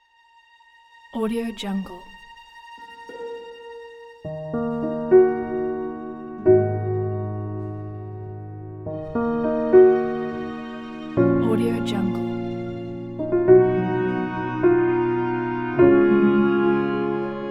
sad cinematic

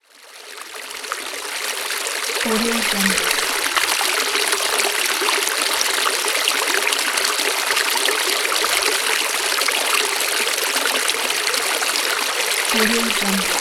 stream water pack

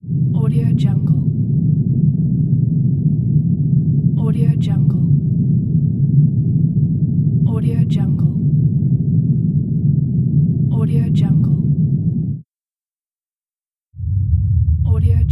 spacecraft relaxation noise

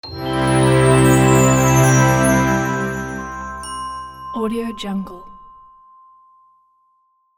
short orchestra chord logo

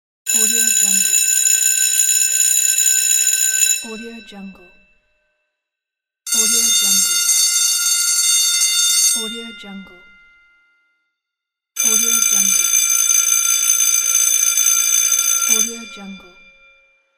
school bell

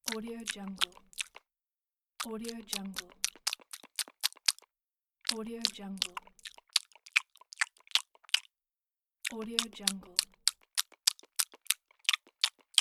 the cartoon eating

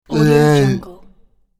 zombie inmate

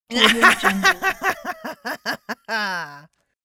bad witch laughter

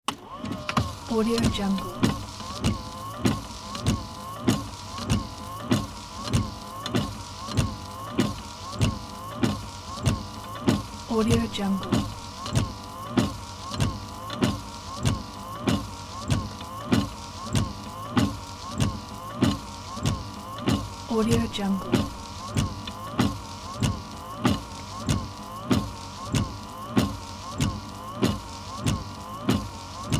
80s car windshield wipers

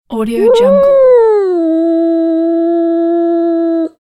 cat yowl; catsoundstudio

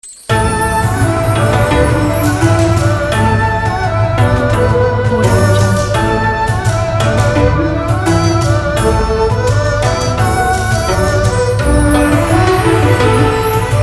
lunar new year

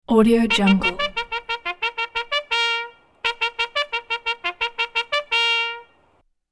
double call bugle call; sound ideas

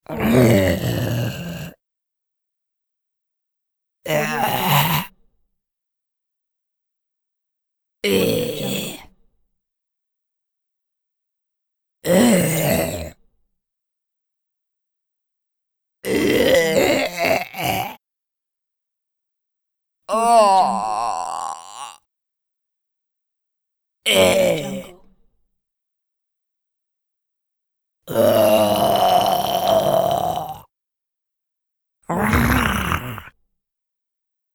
female zombie voices

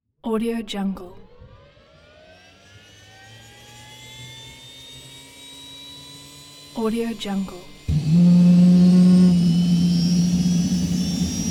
helicopter start

